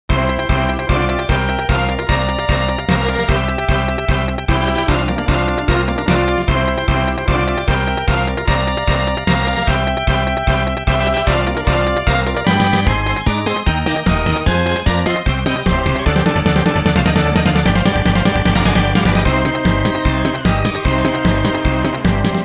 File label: [SFC Chip]; Gotta Protectors